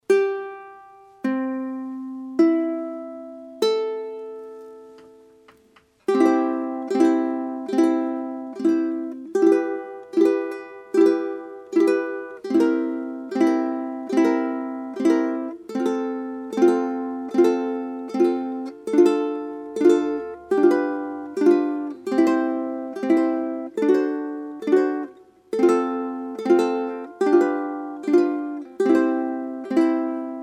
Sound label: ukulele; fukushima